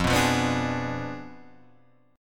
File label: F Diminished 7th F down Guitar Half Step Down